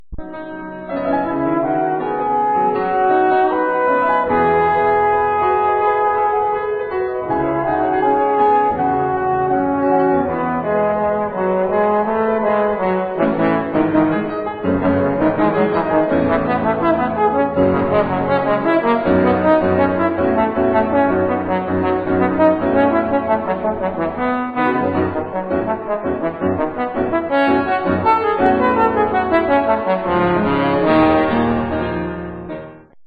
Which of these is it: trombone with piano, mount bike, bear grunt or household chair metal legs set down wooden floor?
trombone with piano